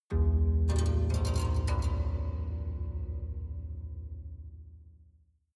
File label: haunted strings sequence low soft